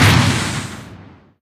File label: giant common step; source engine; tf; mvm; video games